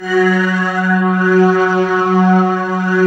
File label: SYN MORP, Roland; Sound & Vision - Gigapack II; Morpheus